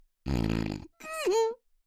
snoore; Racetrack Riches; megaboard

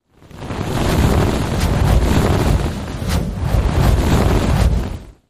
flame; Hot Spin Deluxe

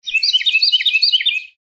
outro sfx; Formosan Birds

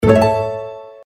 fairy scatter; games; Fairy Dust

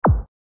stop; Party Night

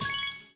scatter fast; Golden Sphinx; Wazdan

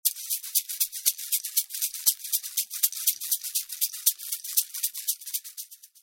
gambler symbol lemon; Fruit Fiesta; wazdan

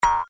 circus reelspin click; games; Crazy Circus

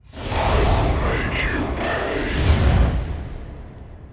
wall; Demon Jack 27; Wazdan

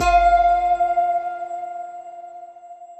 bom prize normal

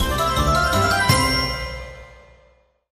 bonus win; Fishing For Gold

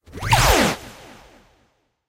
gambler draw; Magic Stars 5; Wazdan

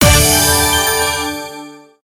bonus chance; Diamond Cats

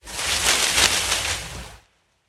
gambling bush shake; In The Forest; Wazdan

